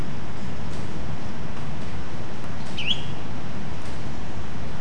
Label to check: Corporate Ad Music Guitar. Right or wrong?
Wrong